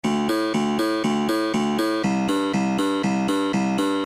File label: music; fallingblocks